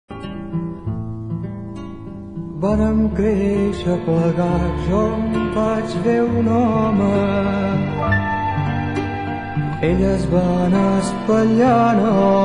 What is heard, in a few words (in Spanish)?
una guitarra